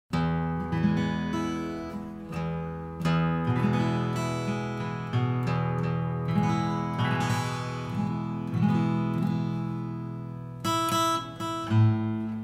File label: flat top guitar; 000 Size Double Top; Don Latarski